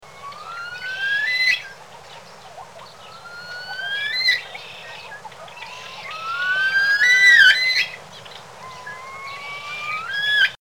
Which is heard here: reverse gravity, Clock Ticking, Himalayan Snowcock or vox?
Himalayan Snowcock